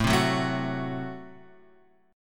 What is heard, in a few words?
A Minor 6th A; Am6 Chord {5 3 4 2 X 2} strummed